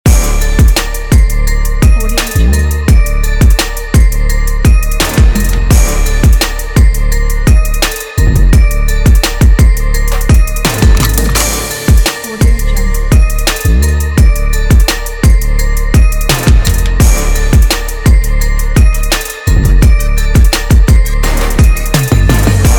the trap loop

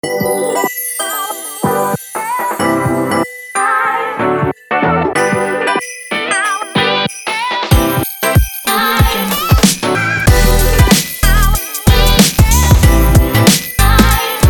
christmas hip hop

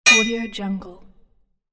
sword impact on metal shield; kazoomx

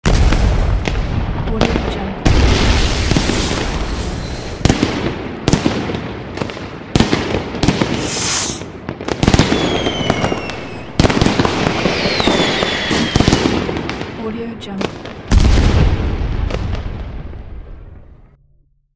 fireworks

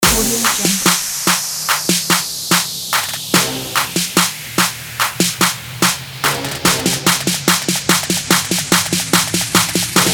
rap

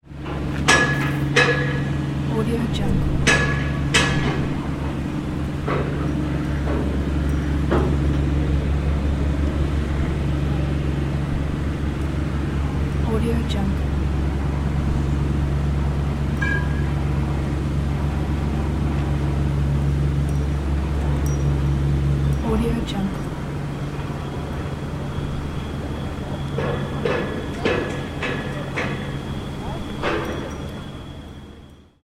construction site with hammering and drilling; alexander-blu

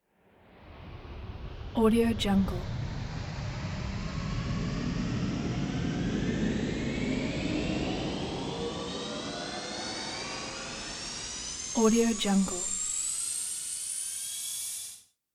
power build airy wind up whooshy whiney turbine; prosoundfx

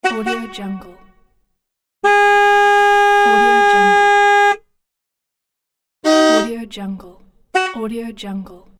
car horn

